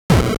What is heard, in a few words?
explosion; famiconse